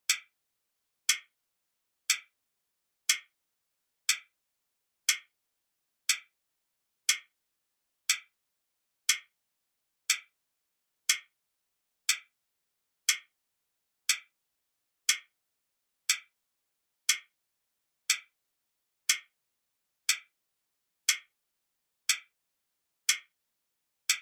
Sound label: clock sound